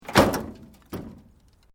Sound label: Metal Light Movement Minor; Materials